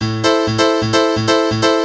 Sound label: m1 piano; bitwig